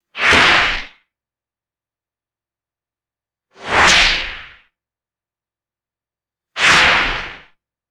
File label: four stylized synthetic whip cracks